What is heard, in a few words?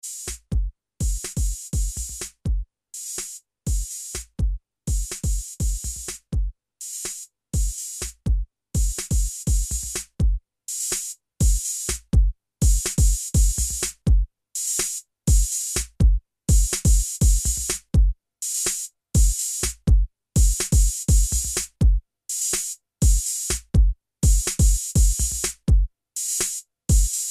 electro drums beat